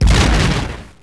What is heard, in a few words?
cargoexplode; weapons explosions